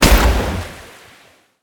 basic hit; Smite; Sol

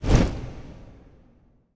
phoenix rebirth flap; smite; npc

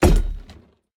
clap; argus; smite; hera

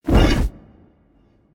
swing up; smite; npc; grkconqs8 titan chaos; s8chaostitan

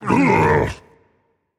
ugh rage; chaac

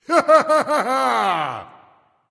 nv yay; smite; voicepack; ymir

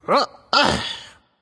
nv grunt lift; cupid

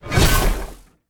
auto swing; smite; vulcan

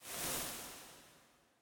fire; smite; hades

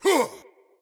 attack grunt; smite; god odin

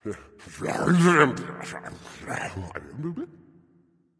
grumble; Smite; bakasura

